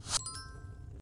right answer pencil sketch

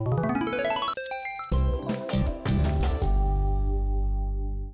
game complete effect

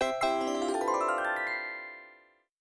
game win

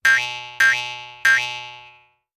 outOfBounds

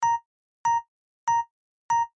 high pressure weather

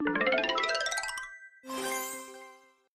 game win

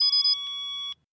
time up effect

